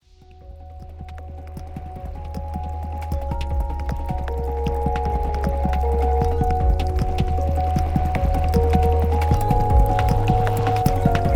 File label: DUBSTEP